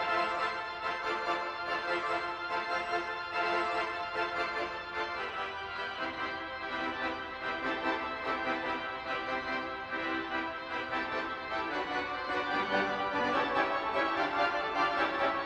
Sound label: toccata